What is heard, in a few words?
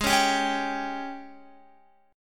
Ab Major 7th Suspended 4th Ab down Guitar 4E 4A 5D 5G 5C 6F